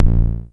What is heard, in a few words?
KLONE XMOD, Dual Percussion Synthesiser